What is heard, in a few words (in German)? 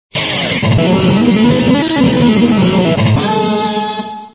klavier